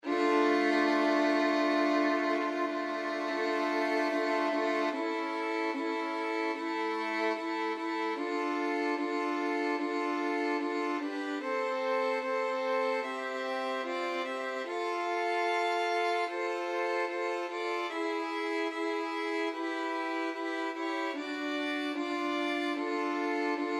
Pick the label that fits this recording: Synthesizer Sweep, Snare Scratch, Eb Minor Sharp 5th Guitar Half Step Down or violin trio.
violin trio